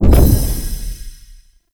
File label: Casting Device Impact Damage; Weapon Impacts; Player; Casting Devices; Galene Casting Device